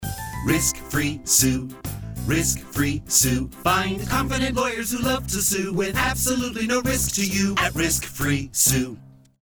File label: JINGLE TAG